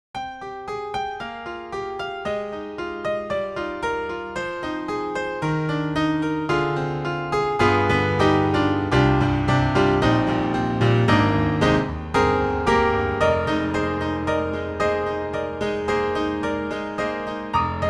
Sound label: 7 Foot Sound; IK Multimedia; Sonik Synth 2